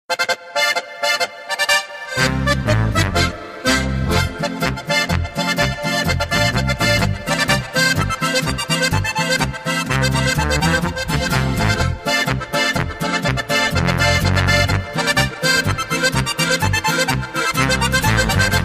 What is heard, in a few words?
polka